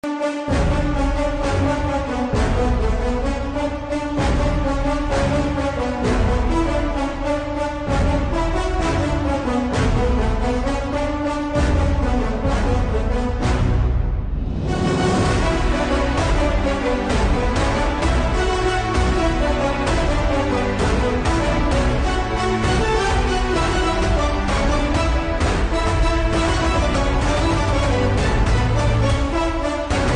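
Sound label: instrumental background music, mass background music, mass entry; avesham movie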